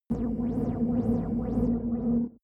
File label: space humming machine